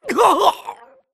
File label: grunt death neck; Titanfall 2